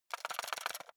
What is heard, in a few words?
cassette shake short